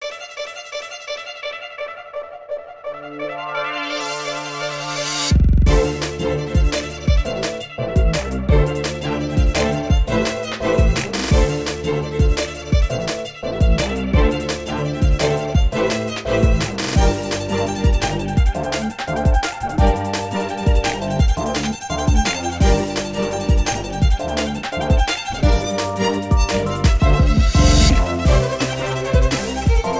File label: neoviolin musician; bgm